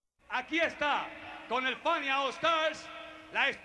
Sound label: Solo; Mario